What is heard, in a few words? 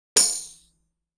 tambourine